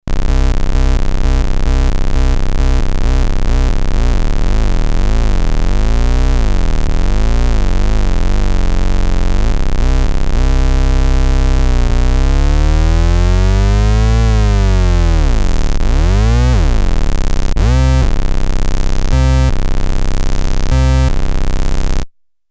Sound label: test portamento, novation bass station 2; analog monophonic machine with subtractive synthesis